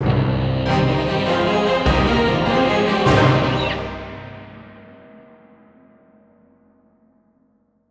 task hard completed; Wilderness

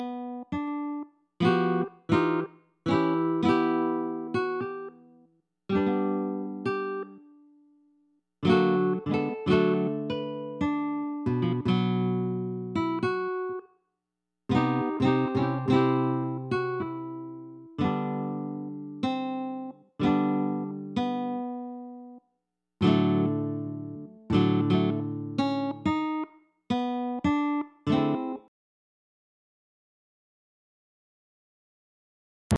Playing the Lute; betrayal at krondor

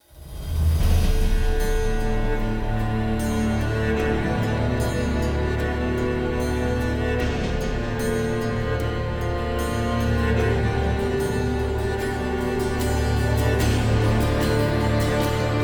Breaking In; The Witcher 3 Wild Hunt

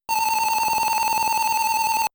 Hurry-up Sound 1st Spurt; Mappy

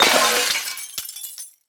Crash Objects Glass; gamerip; Car Simulator 2; android

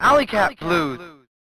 Level Intro; Alleycat Blues

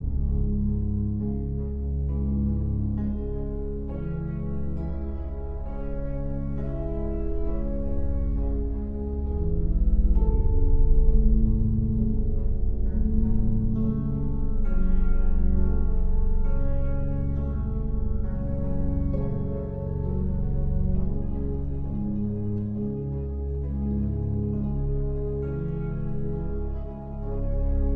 Eerie Linear Loop

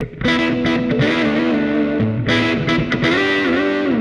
Guitar wet; Nimbus Station; lego universe macos windows gamerip 2010

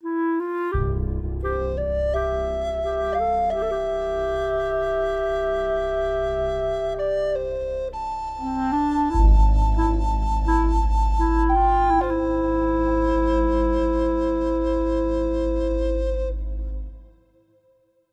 boss intro intensity head 172bpm; chicory a colorful tale; ps4

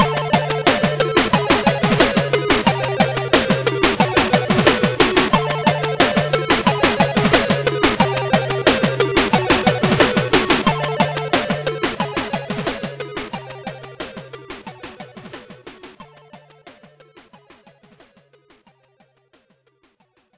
High Score; Master System

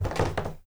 CREAK Wood Hollow Deep; mono; adams venture origins

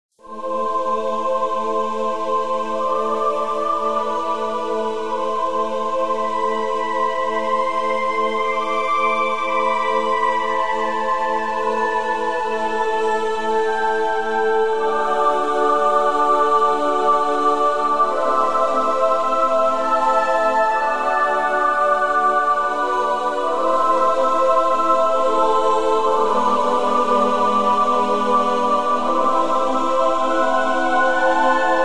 Church; Joan of Arc

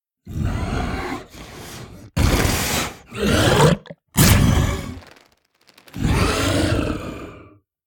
Idle Spit; The Town Sam; Devour 2021 gamerip pc macos

